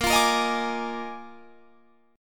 Bb 11th, Guitar, Drop D

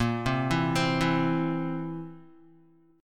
A sharp Suspended 2nd Flat 5th A sharp, Guitar; arpeggiated; A#sus2b5; JGuitar

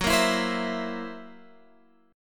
Gb 7th Flat 5th Gb down Guitar Open G

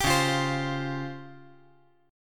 Db Major Sharp 11th; strummed; JGuitar

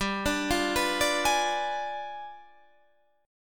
D Diminished 7th G; Ddim7/G

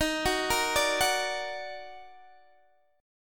D sharp Minor Major 7th, Guitar Open D

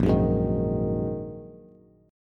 Bb 7th Suspended 4th Sharp 5th Bb down Bass; strummed

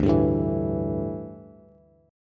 D Suspended 2nd Suspended 4th D, down, Bass; strummed

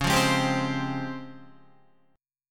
C sharp Major Sharp 11th, Guitar; C#M#11 strummed; JGuitar

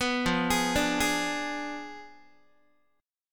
F Diminished 7th F down Guitar; 14,13,11,12,12,x; Fdim7 arpeggiated; JGuitar

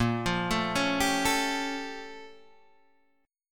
A sharp Minor Major 13th, down, Guitar, Open D

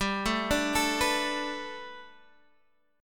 A 7th Suspended 2nd Suspended 4th

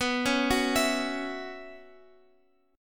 B Suspended 2nd Suspended 4th, Guitar, Open F; Bsus2sus4; arpeggiated; x,x,11,8,9,11

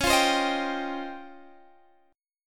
Db Major Sharp 11th, Guitar Half Step Down

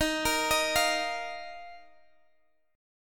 F 7th Suspended 4th D sharp

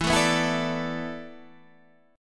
E 7th Suspended 4th

